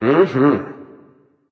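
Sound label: non verbal agree, voice; cernunnos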